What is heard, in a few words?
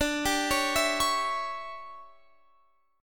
D Major 7th Suspended 2nd Suspended 4th D, Guitar, Open F; DM7sus2sus4 arpeggiated